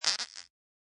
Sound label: Squishy Fart